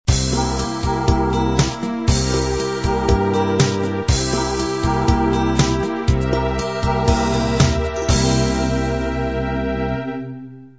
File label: pop beat end